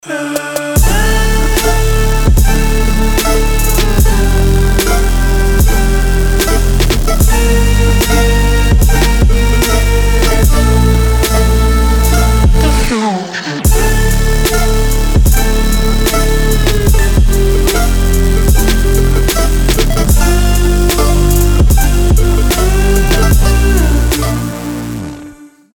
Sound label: bass chill trap